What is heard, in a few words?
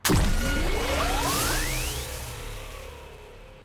omnitrix transform; Ben 10 - Hero Time Game; ThrillGames